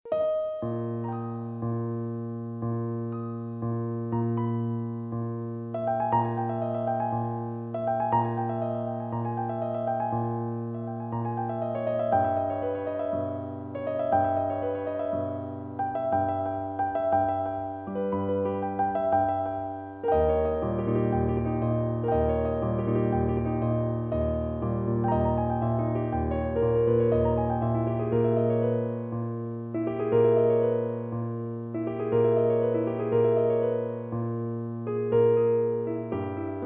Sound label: Piano